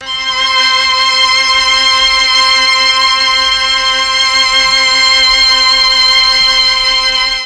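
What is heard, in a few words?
C4 MIXED STRINGS, Mellotron; Mike Pinder - Mellotron Archive CD; MIXED STRGS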